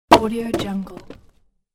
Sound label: throwing wooden log; fxprosound